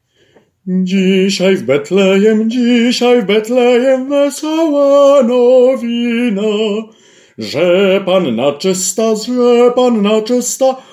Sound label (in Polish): Dzisiaj w Betlejem SOPRAN